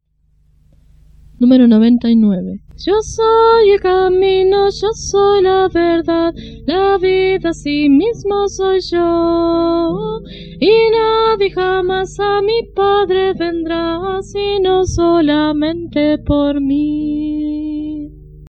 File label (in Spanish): Coro, himnario niños